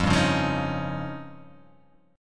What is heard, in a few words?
F 7th Suspended 2nd Sharp 5th